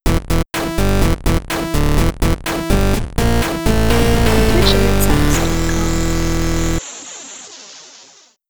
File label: 8-Bit Raid